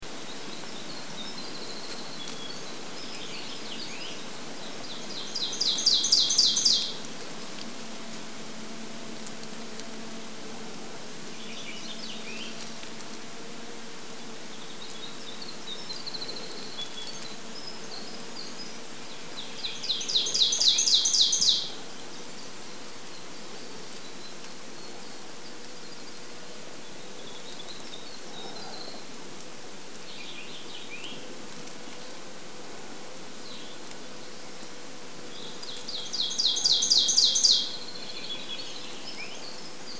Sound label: Ridge Morning Chorus; over the ridge through the forest in search of a stream